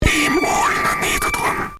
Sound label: notif